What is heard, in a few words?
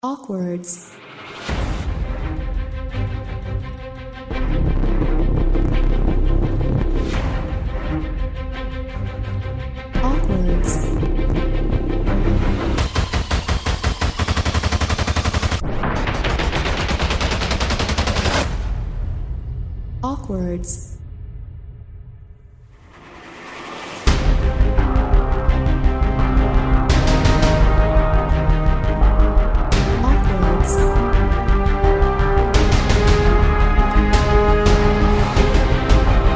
highly tense dramatic hybrid